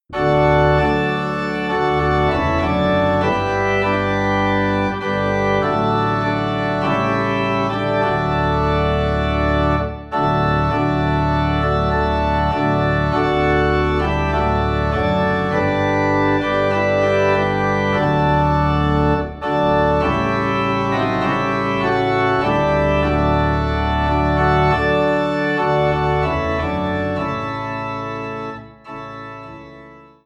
Organ Low in D

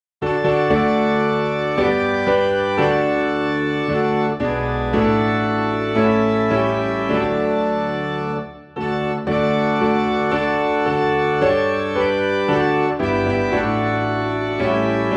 Piano and Organ in D; What a Wonderful Savior

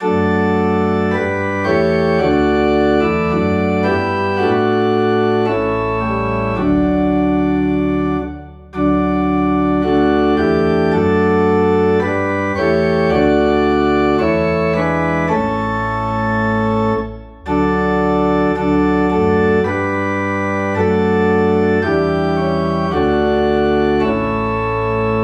Organ in D

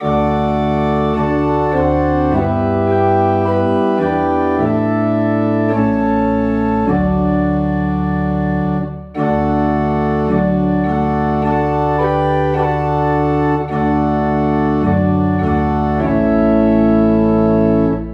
Organ in D

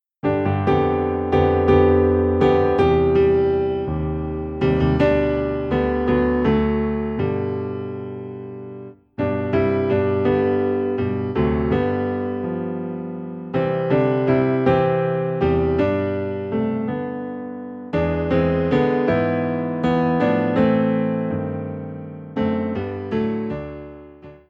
Piano, Low in G; His Eye Is On the Sparrow

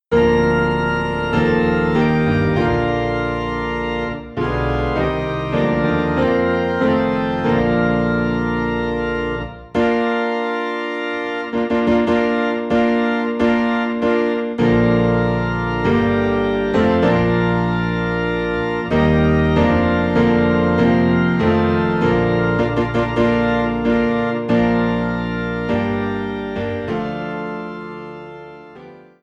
Piano and Organ Low in B Flat; God of our Fathers

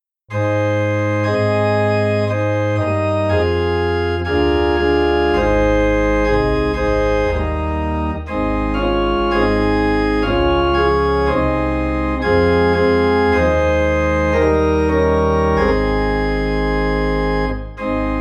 Organ in A Flat; How Firm a Foundation

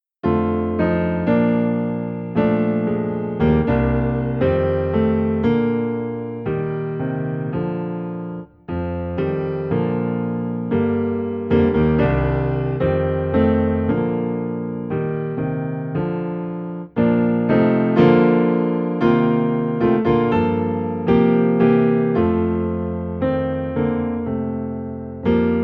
Piano Low in B Flat; Let Us Break Bread Together

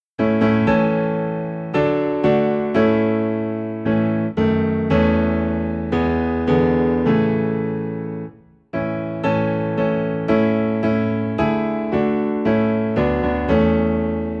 Piano Low in A; What a Wonderful Savior

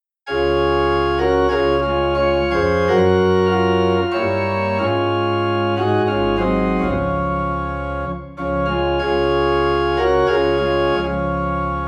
Organ in D Flat; Jesus Paid It All

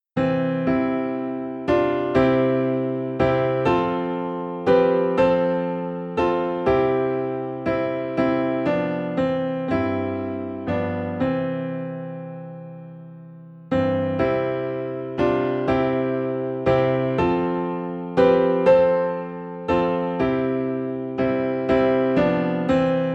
Piano in C; Sweet Hour of Prayer

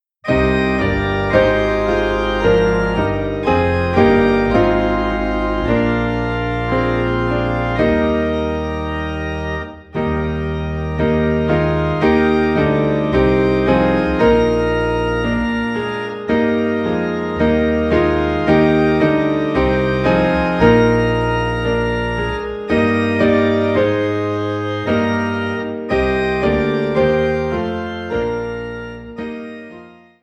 Piano and Organ in E Flat

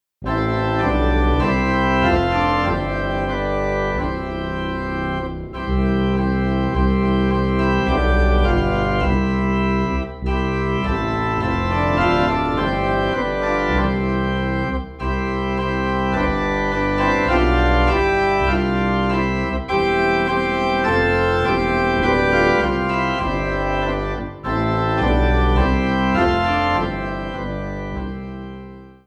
Organ Low in C; Take My Life and Let It Be

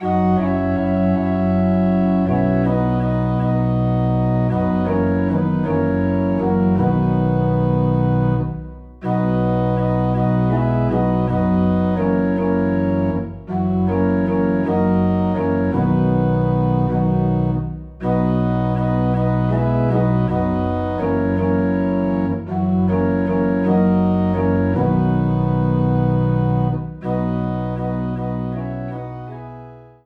Organ Low in B

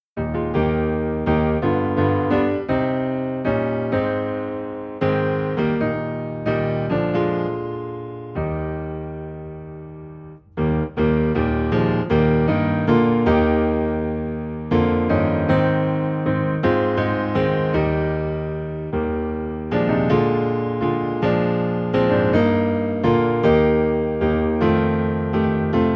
Piano Low in E Flat; In the Garden

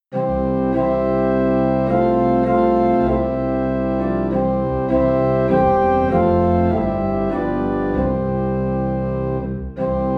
Organ Low in C; My Jesus I Love Thee

